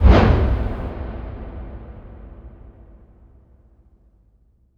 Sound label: Impact Wipe, Cinematic